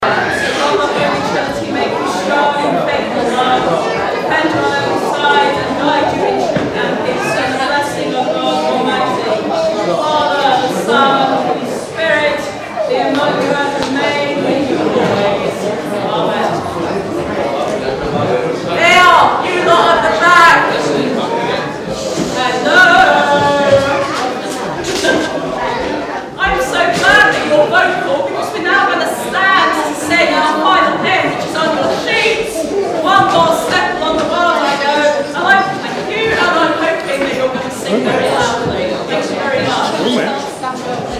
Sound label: rude congregation sequence